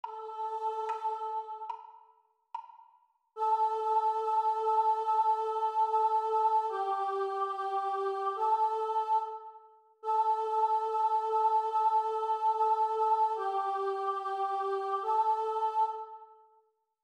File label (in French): Chant Vx Seule